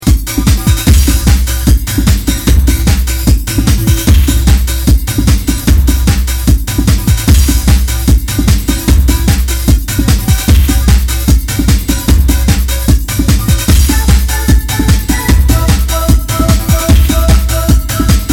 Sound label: Spikey Trance